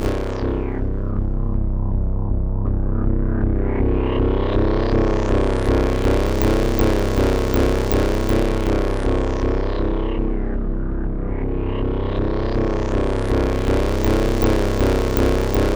C1, SynthLine; 90 s Sample CDs; NiceBeats; SynthLine VOL-1

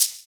Shaker; Equipped Music; Loungin House

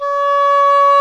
DOUBLE REEDS; OMI - Universe of Sounds

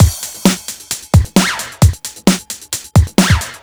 132 Full, Drum Loops; Zero-G; Soundclash Nu Dance Electro; Acidized Wavs; 132bpm; Bump n Groove

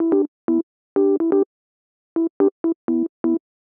125 Rhodes Dom E, Keys, Nu Jazz House; PowerFX - Nu Jazz House

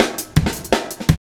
Heavy Live Cut, Jungle, Drum Loops 165.5; Zero-G Jungle Warfare 1, 2 & 3

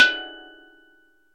STEEL DRUM

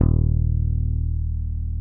P BASS SUSTN, D#1; Sampleheads; Will Lee Bass Library

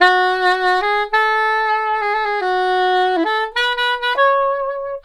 SAX, Balkanish Traditions; USB Soundscan vol.63; AKAI

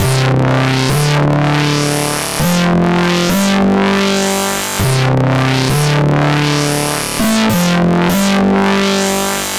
C64 Guitar; 8-Bit; Chiptune; Commodore 64

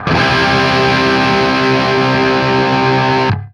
open C, open chords, guitar; East West; Steve Stevens Guitar Samples Collection

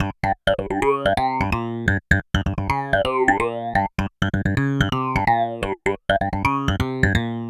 Filtered Keys, Funk; Dr. Fink's Funk Factory

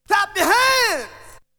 Vocal; ZERO G - Datafile DISC 2

